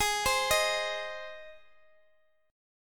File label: Ab Major Ab down Guitar Open D